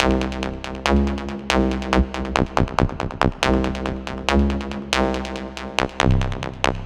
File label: Brute Reverb, dubstep